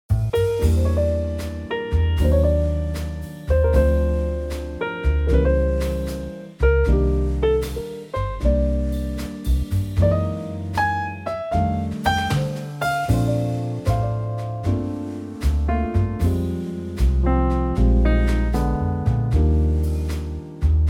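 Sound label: Bb trio, Backing Tracks for Male Vocals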